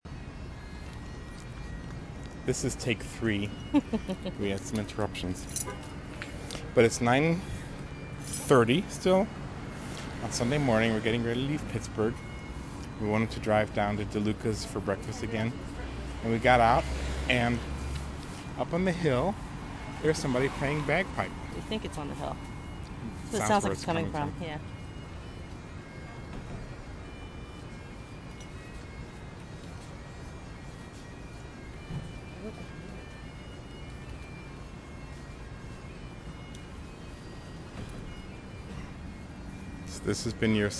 the pipes the pipes are calling

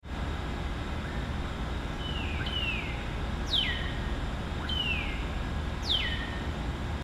dawn chorus air con and grackles; Tampico

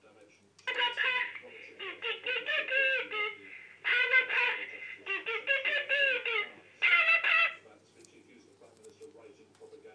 new doorbell

